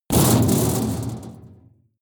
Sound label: metal impact sheet bright rattle hard; hits explosionen; metall